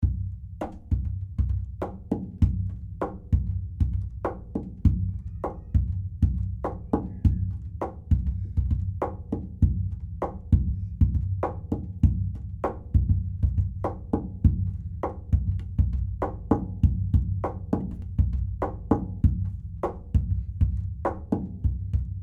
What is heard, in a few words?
Groove